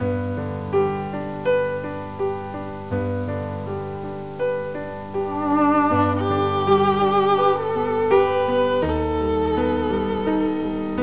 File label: violi; cdromtoday